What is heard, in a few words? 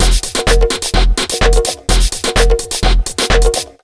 127 BEAT, Disco House; USB Soundscan vol.20; Fresh Disco House I; AKAI